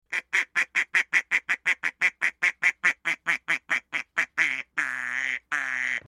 Duck Quacking; birds

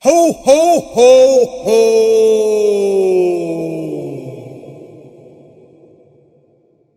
Santas Ho Ho Ho with Soft Bells; christmas

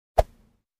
High, whoosh

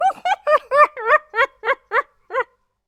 Hyena Laugh; Dark humor

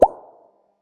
UI Button Pop

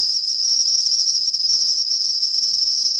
Cricket Chirp; Night ambience

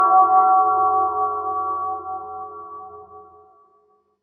Distant Temple Bell

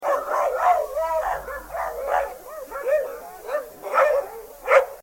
Dogs Crying at Night; horror

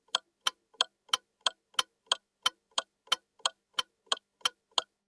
Indicator Tick

